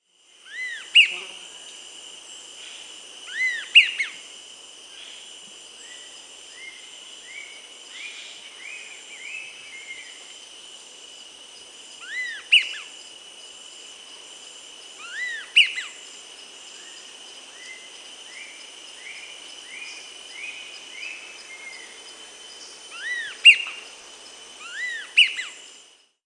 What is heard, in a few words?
Sirystes (Sirystes sibilator), Peru; S. America